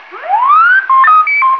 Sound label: R2D2; STARS FXS; East West; Global Sounds